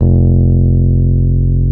F 0, Electric Pianos; Wizoo Electric Pianos

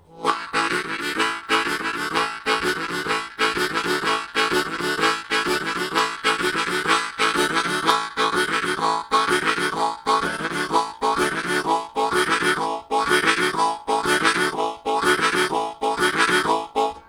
Lamb Chug, Harmonica Riffs; Zero G - Harmonica

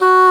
WND ENG HR, English Horn; Roland LCDP04 Orchestral Winds

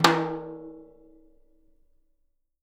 Living Drums, JZ XTOM 1

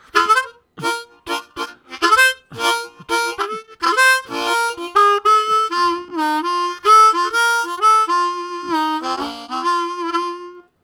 Gapping, Harmonica Riffs; Zero G

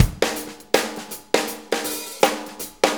Shuffle Fill, Drum Tools

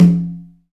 PRC XCONGA, Skin Drums

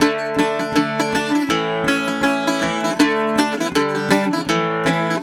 Celtic Flavours; USB Soundscan vol.42; AKAI

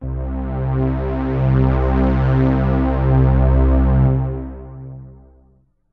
pad c2, Synths; fatman

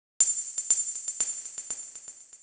Ride Beat, Electronic Beats; Sonic Foundry (Sony Creative Software); Myths of Technology

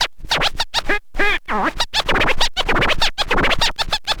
scratch short, Vinyl scratches

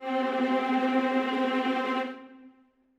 viola trem C4, Strings; BBC

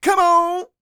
Vocal Planet R&B & Dance; ILIO

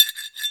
BELL SCRAP, AFRICAN PERCUSSION; AKAI S6000 CD-ROM - Volume 1

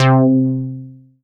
FROG BASS; Masterbits; Dance Trax

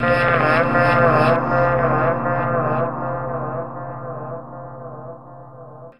howler, Drum N Bass; Sonic Foundry (Sony Creative Software); Knutrix Drum & Bass

